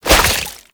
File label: Sticky Ink Tentacle Whip; Shadowgrapher; Mutator